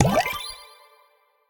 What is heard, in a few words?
item potion; Crypt of the NecroDancer